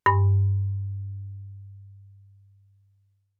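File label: Marimba rubber fortissimo G4; MIS Pitches - 2014; Percussion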